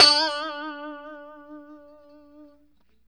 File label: POP D 4, STRAT guitar; Spectrasonics; Hans Zimmer Guitars VOL-1; JC-STRAT POP